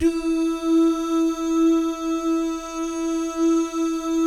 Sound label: "DOO" GUYS, Vocal Jazz Fx